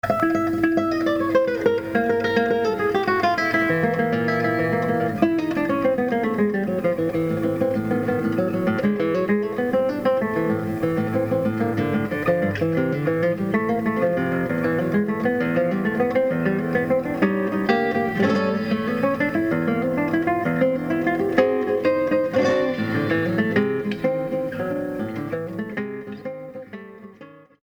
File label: tarantella, classical guitar